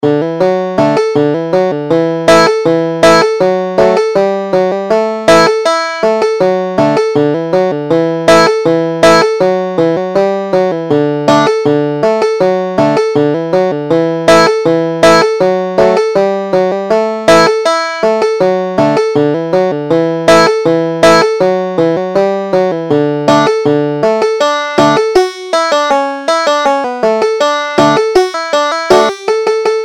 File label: banjo